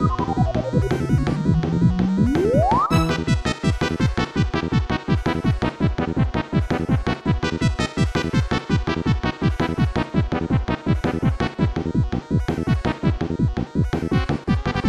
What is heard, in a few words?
Tetris Critical Music